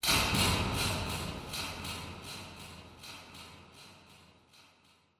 clicking echoes, ambient creatures; Half Life Alyx

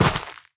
step run, footsteps player grass

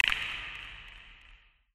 amb creature antlion click; Half Life Alyx

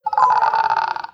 idle, headcrab reviver; creatures; vox

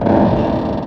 metal box strain; physics

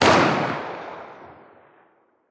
revolver distant, weapons; Counter Strike Model Pack; CS2

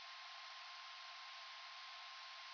roomtone, ambient tones

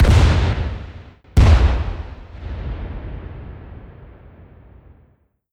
explo; a4 strider

